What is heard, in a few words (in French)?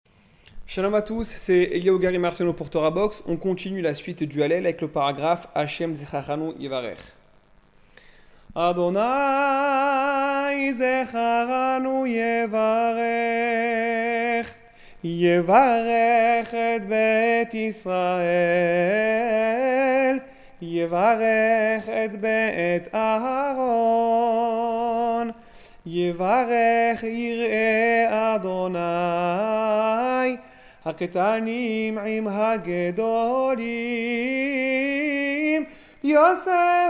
hallel ashkenaze, tefila; hachem zerkharanou yevarekh